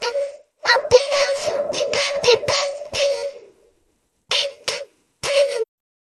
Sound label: jingle